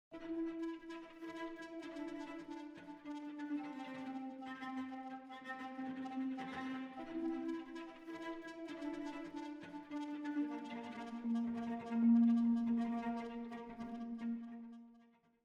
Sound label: Shivering Woos